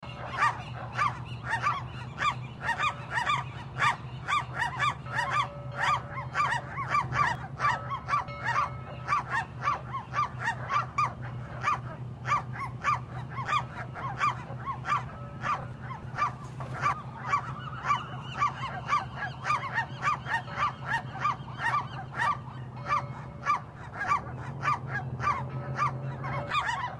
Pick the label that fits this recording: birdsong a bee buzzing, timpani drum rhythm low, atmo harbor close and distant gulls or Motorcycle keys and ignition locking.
atmo harbor close and distant gulls